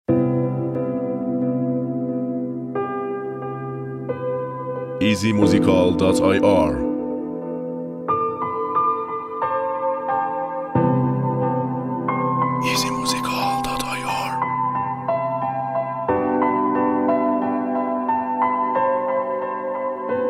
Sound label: Beat